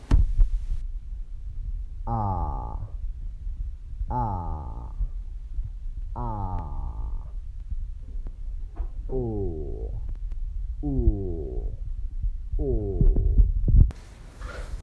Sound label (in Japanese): アー オー 厚い状態